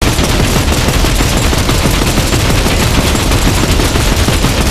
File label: hammerturret fireloop player; gow